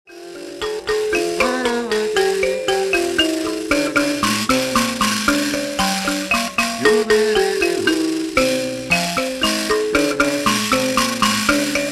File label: mbira